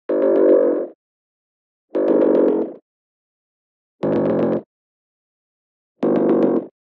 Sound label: Digital Click Negative; boom library; The Interface